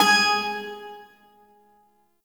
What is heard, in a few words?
Shawzin Oct Two G Sharp; Warframe; Duviri Shawzin